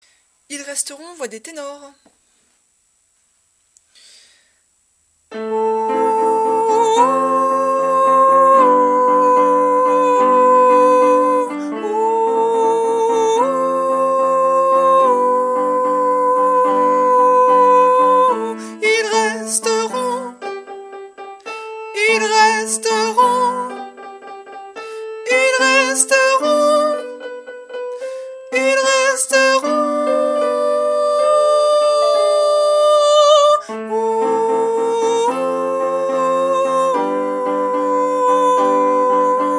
tenors, chorale voix

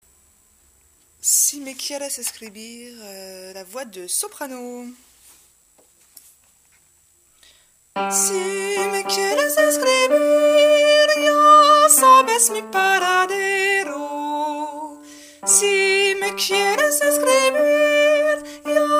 sop, chorale voix

